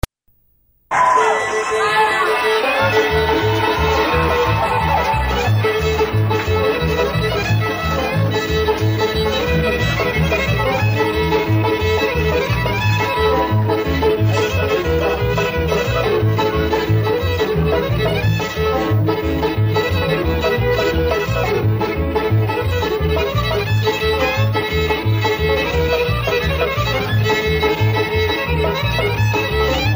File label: Fiddle Tune